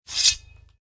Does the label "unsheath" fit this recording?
Yes